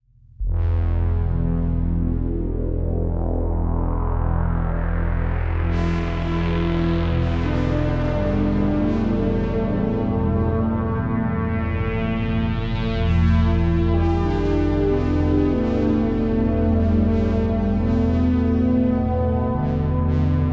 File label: brass pads